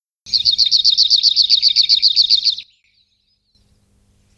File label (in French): bruant zizi